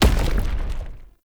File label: Heavy Melee Charge Step